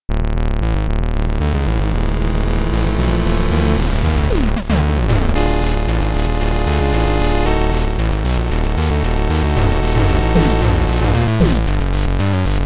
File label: Boss, Chiptune